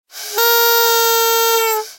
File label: Emote Confetti Goblin